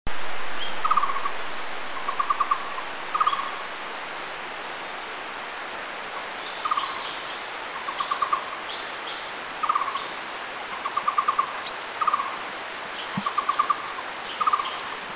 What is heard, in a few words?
Black-browed Barbet, Taiwan